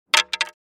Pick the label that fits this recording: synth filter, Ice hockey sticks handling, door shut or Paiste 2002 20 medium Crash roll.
Ice hockey sticks handling